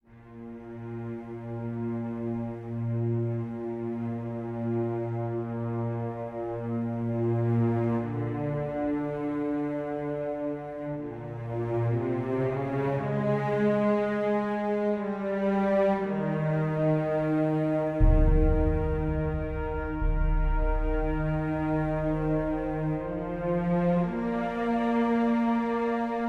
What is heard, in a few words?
World Music